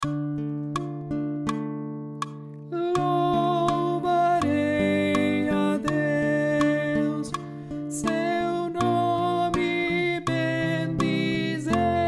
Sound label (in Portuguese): contralto